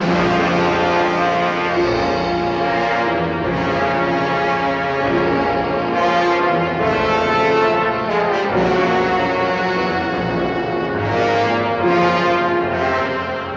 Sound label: horns, Sad